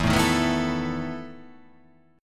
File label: E Major 7th Suspended 4th